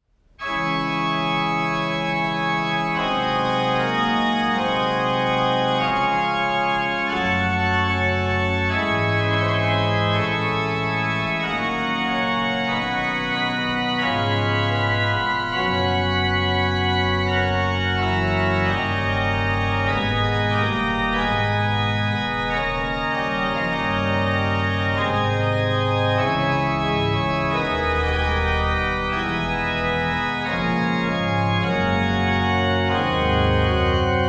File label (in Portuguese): Orgao Arouca; PureSound